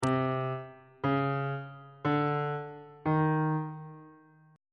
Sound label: e flat 7th intervals on bass clef